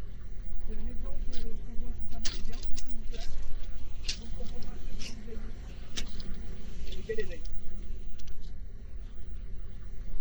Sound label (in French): plage